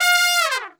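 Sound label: F3 trumpet, Brass Super Section; Best Service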